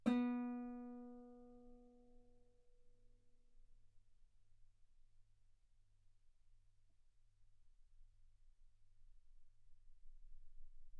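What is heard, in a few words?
Guitar ff B string B3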